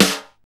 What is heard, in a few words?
perfect snare drum sample g sharp; Duration 0:01; Samplerate 44100 Hz; Channels Mono